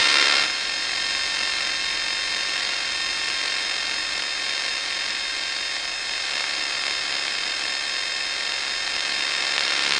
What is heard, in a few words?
light data swirl or inter, activate